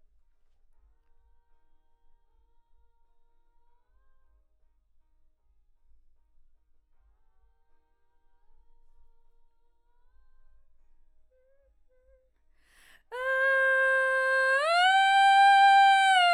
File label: Vox start new